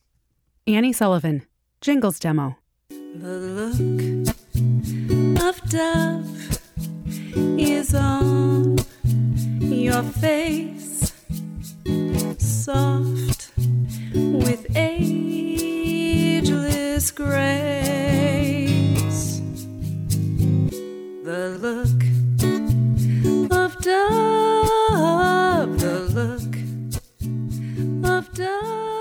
Jingle

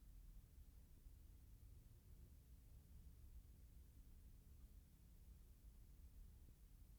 RAW room tone

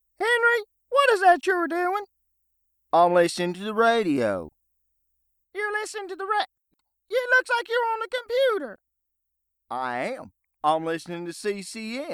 gospel; CCN

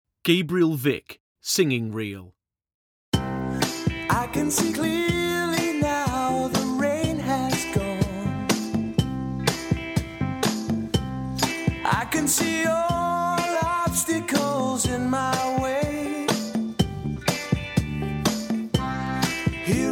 Singing

